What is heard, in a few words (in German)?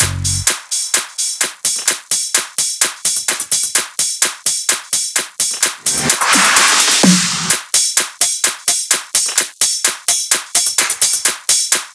Drum Loop 128BPM Full ohne BD f#; Vengeance - Essential Clubsounds Vol.5; VEC5 Drumloops